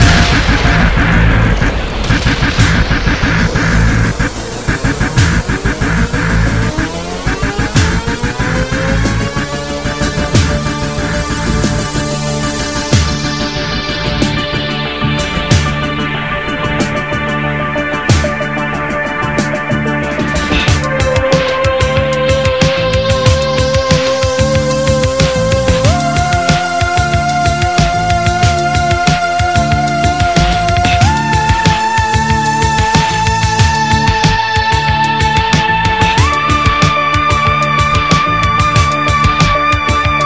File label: bg music intro; svencoop